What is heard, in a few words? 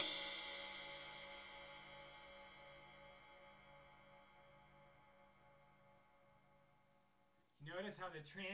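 cymbal, Brushes; Roland - Rhythm Section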